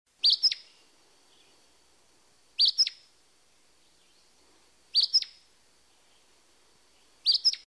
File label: Acadian Flycatcher